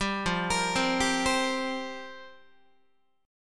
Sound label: F Suspended 2nd Suspended 4th F down Guitar; arpeggiated; 10,13,13,10,11,11; JGuitar